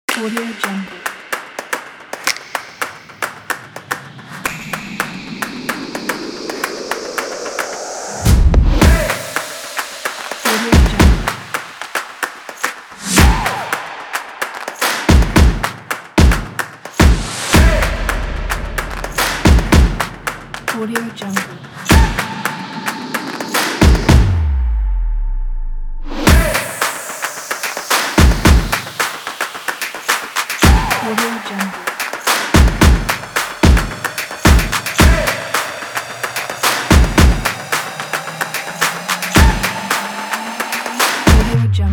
stomp

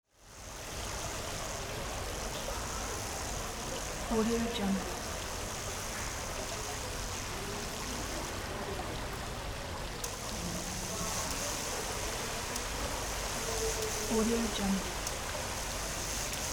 indoor automated water fountain at supermarket

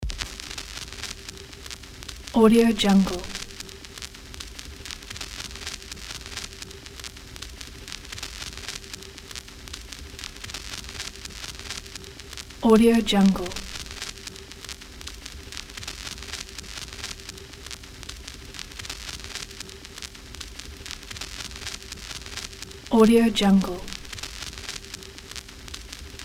vinyl noise

